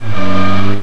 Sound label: Vehicle Start; cstrike